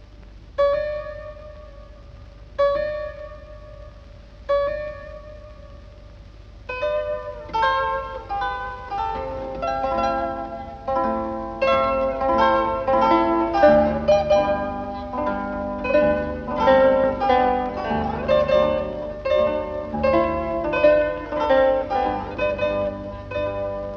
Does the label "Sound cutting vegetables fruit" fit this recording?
No